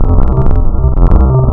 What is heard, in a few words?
VIRUS SQUARE, wavetables